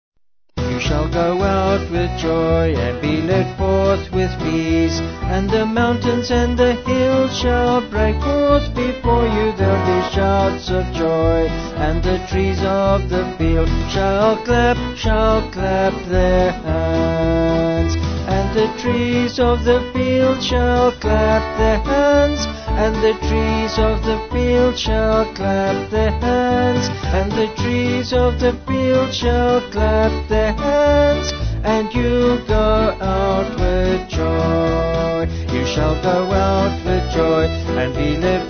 Vocal Band; You Shall Go Out; Fudge

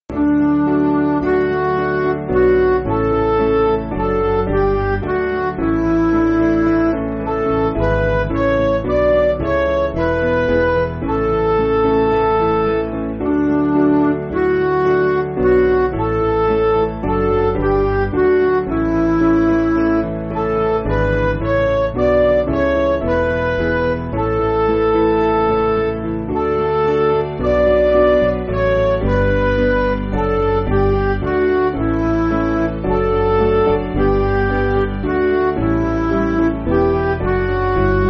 Midi

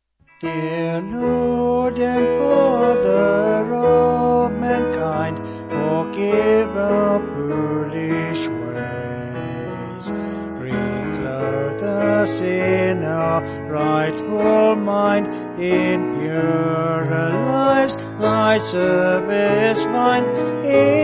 Vocals Piano; Dear Lord And Father; Repton

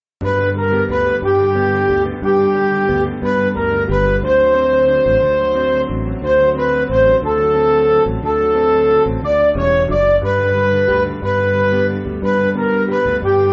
Midi

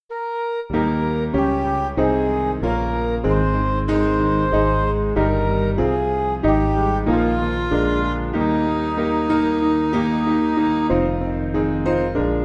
Midi; Cast Thy Burden; Mendelssohn

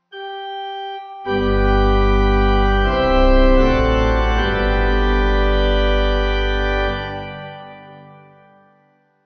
Morning Service Amen Pipe; Lutheran

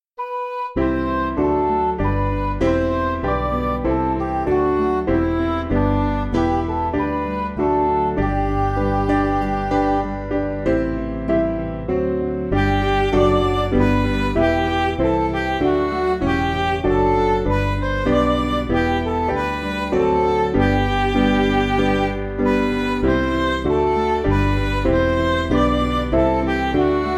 Midi; Come Holy Ghost; St Stephen

